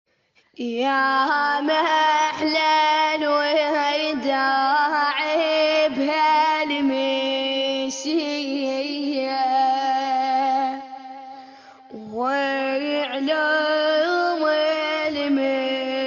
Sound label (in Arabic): الرواديد ناعي